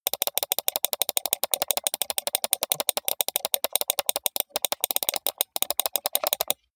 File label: computer mouse click sequence steady long